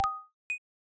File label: sound yes